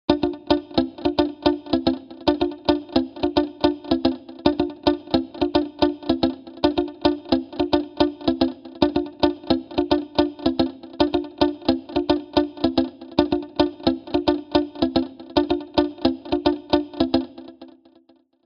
RCF M 20X Delay Chorus Hall

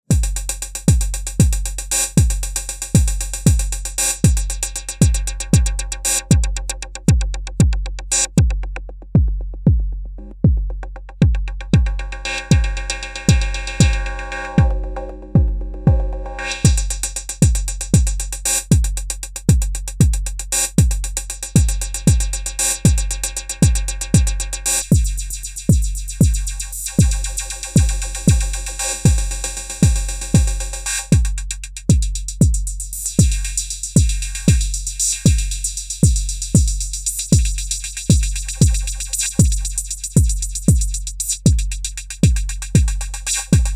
Hihats

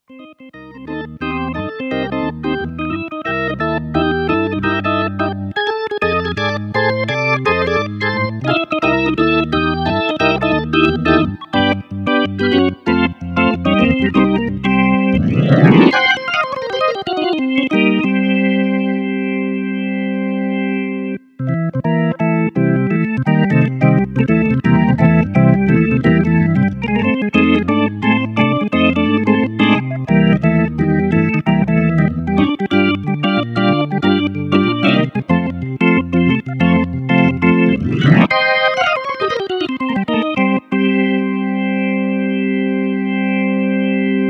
Hammond XK 4 Chorus C1 C2 C3

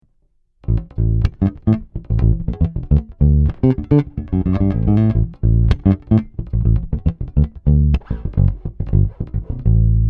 Pure Fingerstyle clean DI, Bass; Markbass MB58R CMD 102

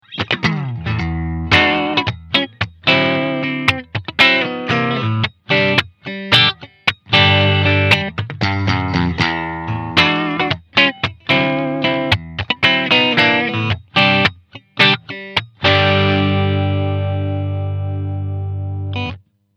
Clean Bright

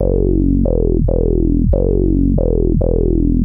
Bass

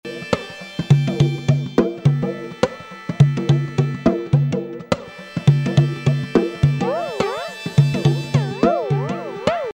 Flanger

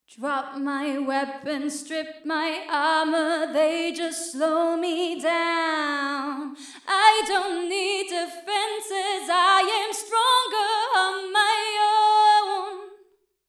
Vocals female EMT250

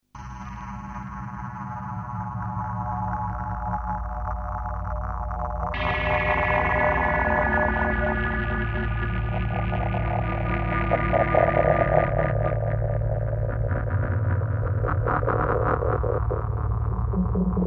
grime

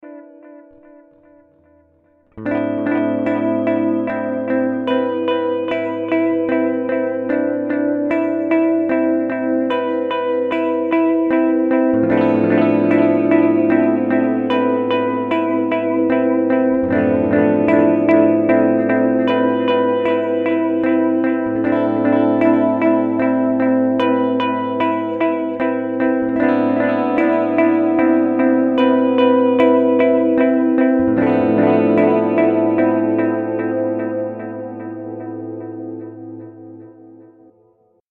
Boss DD 200 Tape

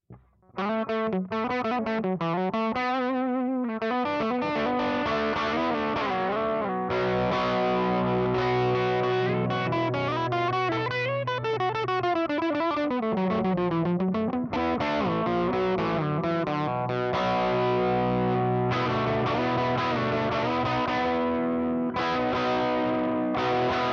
Kemper Profiler Stage Fan EC Trem

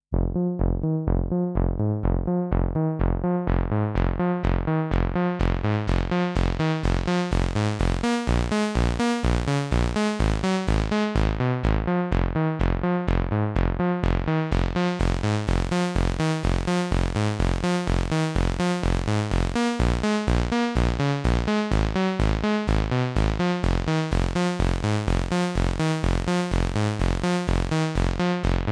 Summit Audio TPA 200B Synth Light Overdrive

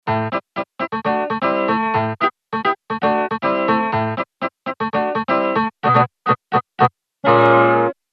Mellotron M4000D MKII Harpsichord

